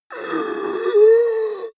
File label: monster inhale; kreaturen